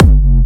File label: Jump Kicks, Bassdrums